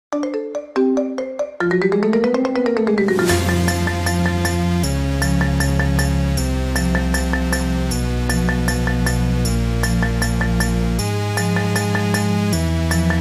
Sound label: marimba ringtone remix